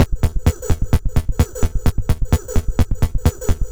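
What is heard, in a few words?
Percussion, Drum Loops 129bpm; Zero-G; Soundclash Nu Dance Electro; Acidized Wavs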